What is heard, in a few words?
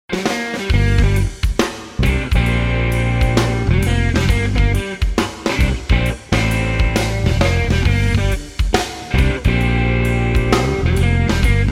Jazz